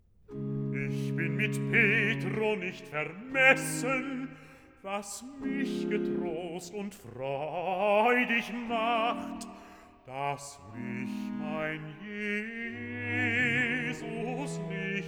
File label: Recitative; Cantata BWV68 Also hat Gott die Welt geliebt; Ich bin mit Petro nicht vermessen; Bach; J S Bach; Koopman Complete Cantatas Volume 14